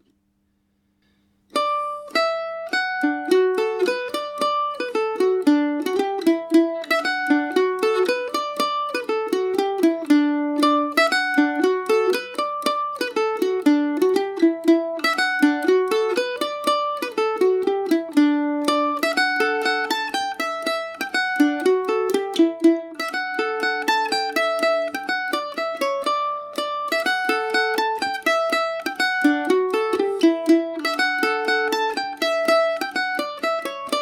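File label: D major, swing; Paris Swing “Macaferri” mandolin; Listen to me playing The Tight Squeeze on my Paris Swing "Macaferri" mandolin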